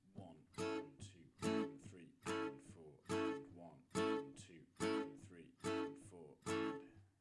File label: reggae rhythm, guitar